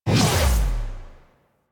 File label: melee heavy attack lift release; Warframe; Weapons; Whooshes; Heavy Attacks